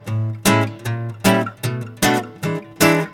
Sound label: Loop Guit; carte blanche for a fistful of teeth; windows gamerip 2006